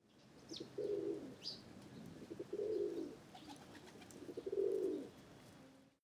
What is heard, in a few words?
pigeon coo